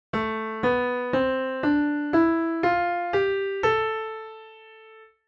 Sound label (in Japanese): ナチュラルマイナースケール, piano